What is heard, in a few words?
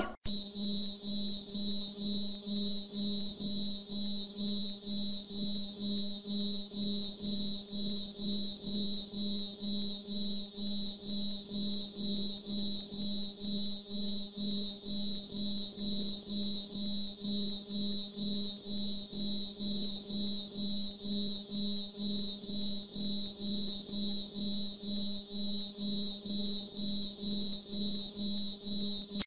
Loudest And Most Painful Sound Alarm Ringtone; ringtones